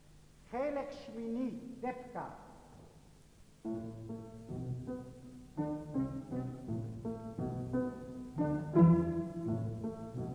Debka; Israeli Country Dances

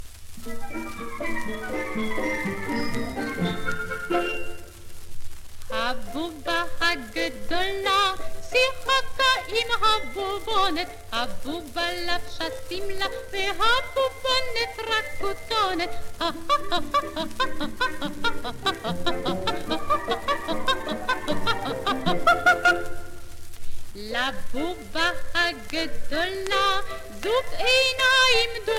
for Voice and Orchestra; Habuba Vehabubonet

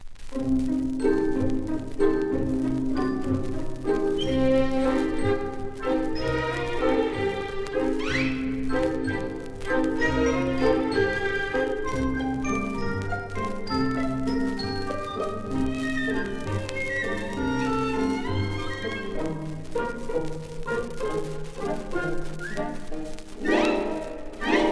Symphonic Orchestra

